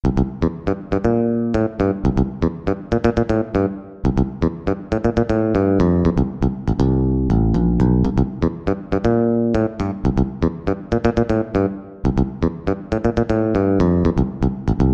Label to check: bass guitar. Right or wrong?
Right